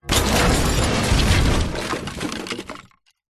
impacts junk hard crash metal plastic glass wood debris tail